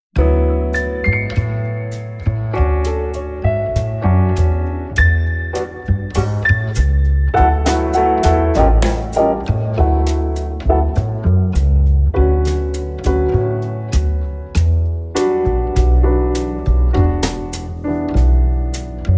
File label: Mid Tempo Samba, flutist; chapter 5 using the whole tone scale in improvisation